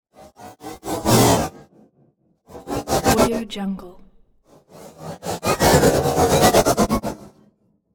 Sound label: wooden blades fly